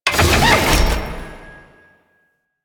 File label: Customization UI Close; Kahl; Warframe; Veilbreaker